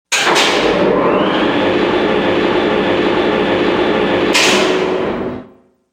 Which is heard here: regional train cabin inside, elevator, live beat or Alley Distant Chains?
elevator